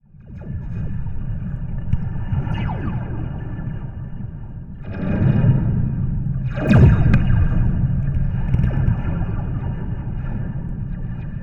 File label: bang, slinky